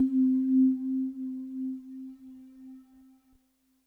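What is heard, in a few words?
sine C3, Yamaha VP-1; Music Store; 90's Sample CDs; NICE SINUS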